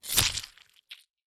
Bloody Stab

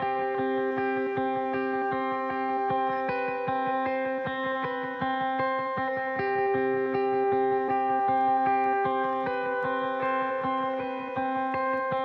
Background Guitar Loop 156bpm C Major

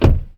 Car Door Closing Dull

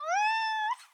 Cat Meow

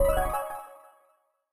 Confirm Sound Mystic Chimes

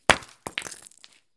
Piece of concrete

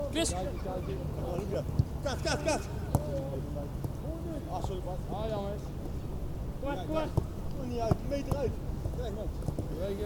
Soccer Training

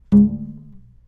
Boing

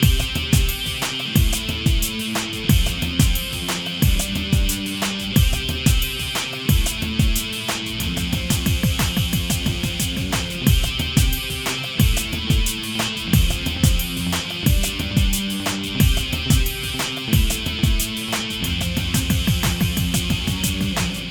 Ska man Loop

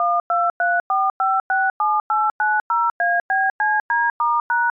Dial Tone String

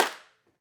Sweet Claps

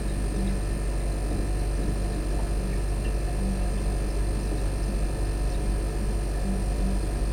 Fridge Water drain

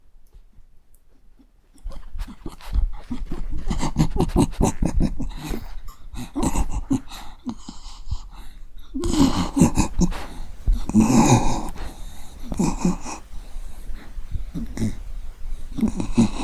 Sniffing Dog

